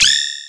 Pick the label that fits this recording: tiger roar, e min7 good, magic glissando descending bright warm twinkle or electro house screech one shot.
electro house screech one shot